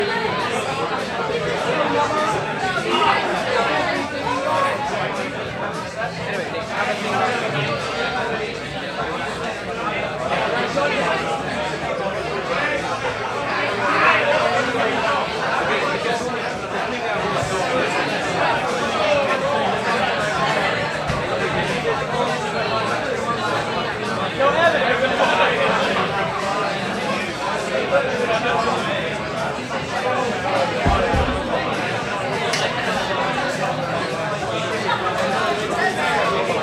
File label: Bar Atmosphere Busy